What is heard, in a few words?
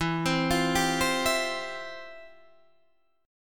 F Major 7th Suspended 2nd, E, guitar